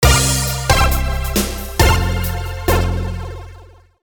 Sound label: Level Start; Giana Sisters; Nintendo DS